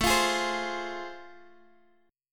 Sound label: A Minor Major 7th Sharp 5th A Guitar Drop D, chord